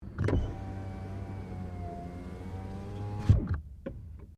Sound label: Car Toyota Corolla window close